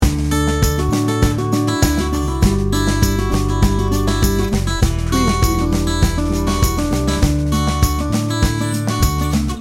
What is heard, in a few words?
americana morning loop